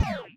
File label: beam miss